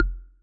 click; fruit master

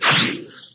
throw; killer io

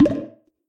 spring; rabbit samurai 2

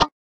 error; octum

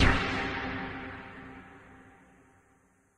gameover; swing triangle

